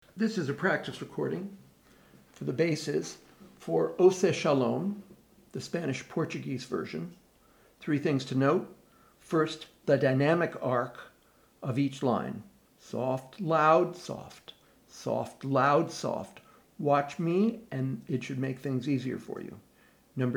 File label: Oseh Shalom Spanish Portuguese bass